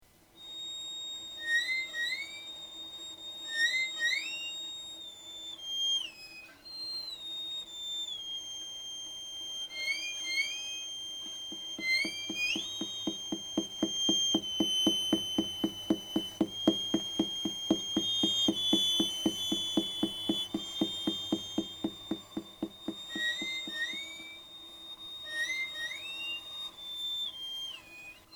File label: anxiety music